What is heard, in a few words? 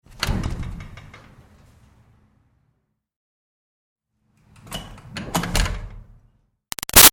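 Door Metal Retail Push Interior Open-Close; Metal Doors; Big Room Sound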